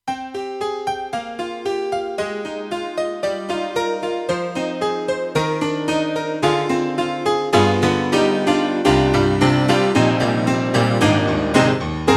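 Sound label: YAMAHA TG300 Honky Tonk, piano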